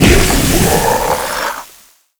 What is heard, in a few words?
Flying Mouth Spawn; Enemies; Warframe; ManInTheWall